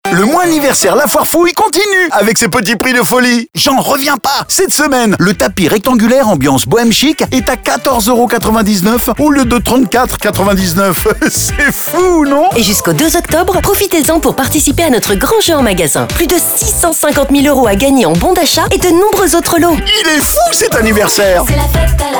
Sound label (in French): Tapis Ambiance Boheme; Anniversaire Foirfouille